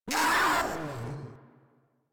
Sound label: Proto Infested Runner Pain; Warframe